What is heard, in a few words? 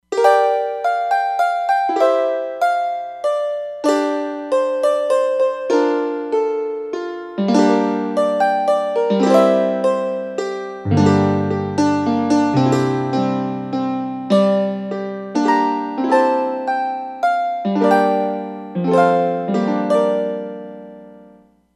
Irish Harp, E-mu Proteus-3; Factory patches